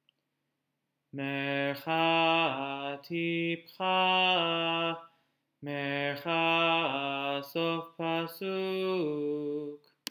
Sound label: Torah Trop Pattern